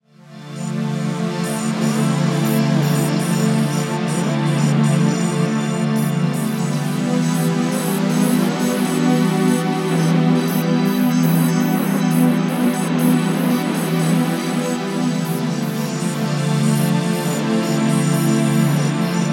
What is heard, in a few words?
Analog Pad